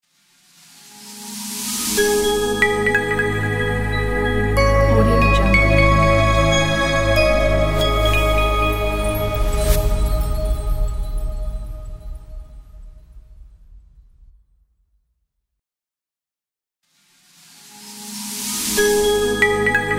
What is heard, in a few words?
clean abstract logo; diamondc